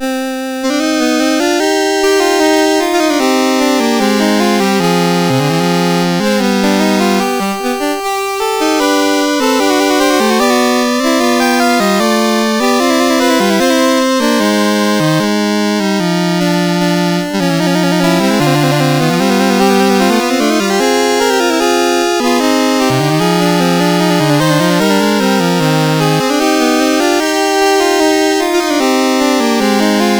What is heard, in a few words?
Canon a 2 per Augmentationen Contrario Motu, CSG8580R5